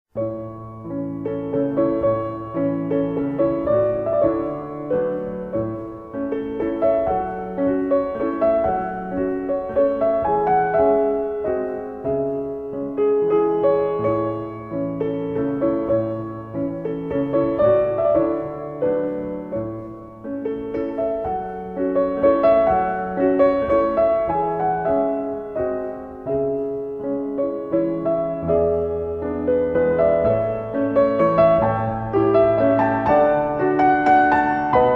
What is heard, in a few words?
waltz in a flat